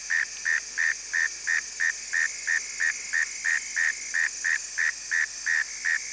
mole cricket

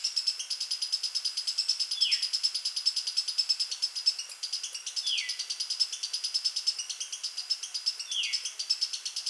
squirrel call; MAMMALS

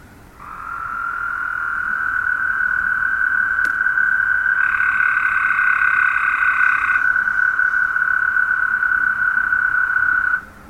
toads

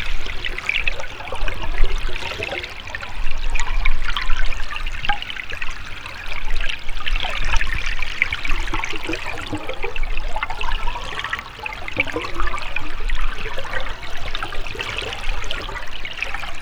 water voices, underwater

